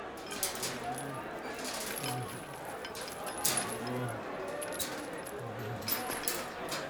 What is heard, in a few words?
talent market